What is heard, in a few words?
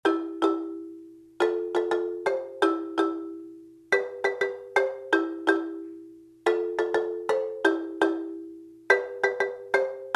partial chords, marimba